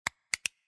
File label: sideup, Keytone sounds, Music Scale; LG; U970-Shine